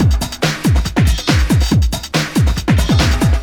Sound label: Loops BB 140, BreakBeats; Vengeance Samples